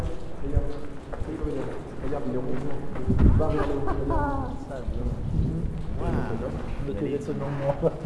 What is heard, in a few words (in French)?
RIRES, EVP; Fort du haut; fort l'ecluse